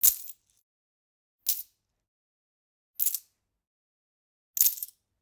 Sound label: Generic Click Metal Small